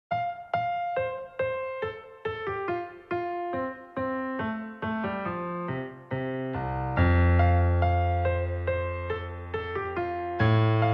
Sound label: karaoke instrumental